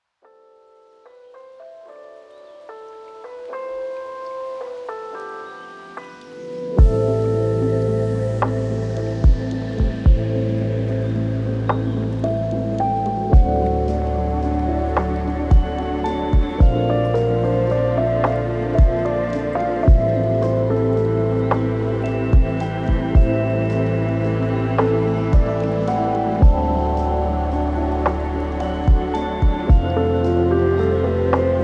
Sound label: lofi sleep; ancient temple; final fantasy ii